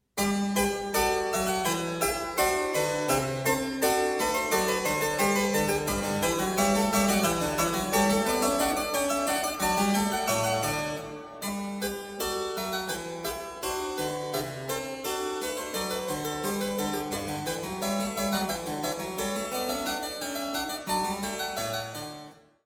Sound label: gavotte